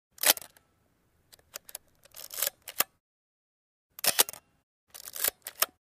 Antique Camera Shutt, shutters; shutters & movement

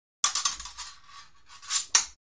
Fencing Swords Rub

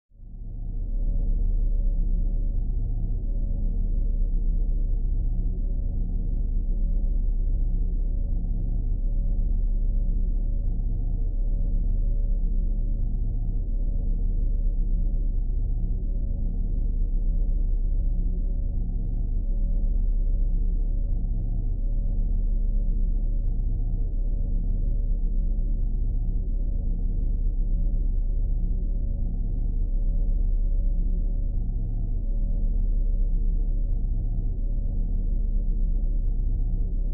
Alien Power Chamber, drones

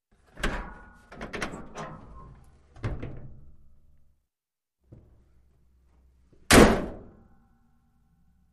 Car Hood O C Spring Slm

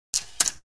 Billiards Sliding

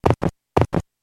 Horse Hooves Two Step

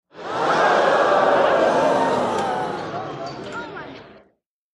Theater Crowd Gasp Oo

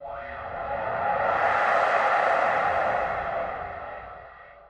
SPACESHIP FLYBY

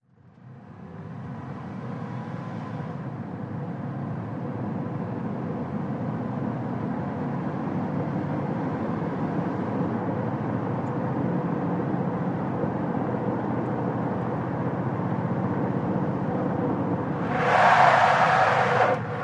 Sm Car Accl Skid, compact

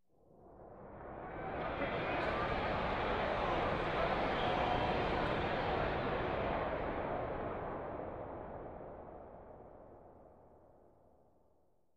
Arena Crowd Transit; sports arena

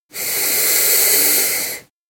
Lizard, Water Monitor Hiss. Close Perspective.

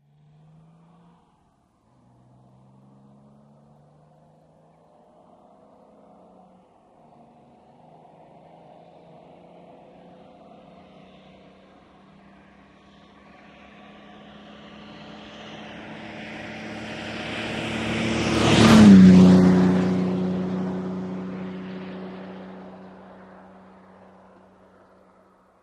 VEHICLES ASTON MARTIN: EXT: Pass, fast.

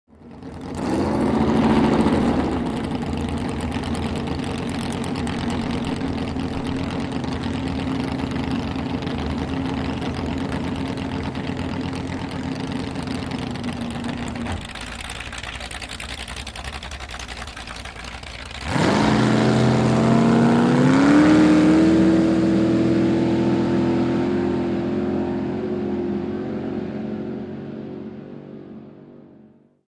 Drag Boat Idle away

